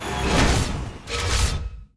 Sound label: damage, monster